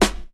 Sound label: sharp steel snare drum f sharp key